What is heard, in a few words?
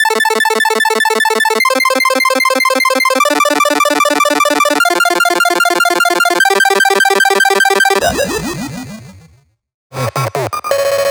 8Bit Sounds; Antidote